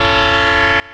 Short, Train Horns; PFx Brick

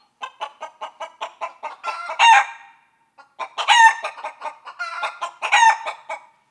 Chicken, Animals; soundfx

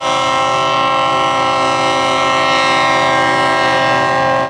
Leslie RS5T Long, Trains Horns; PFx Brick

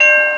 RR Crossing

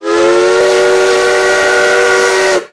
Steam Whistle Long, Trains; Whistles; PFx Brick

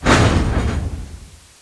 Coupler, Trains; PFx Brick

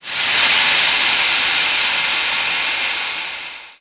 Air Release, Trains

